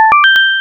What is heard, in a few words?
coin arpeggio extended